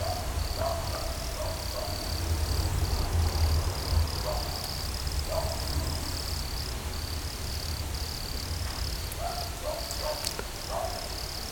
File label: Nemobius sylvestris; Podgorzyce; OrzechowskiR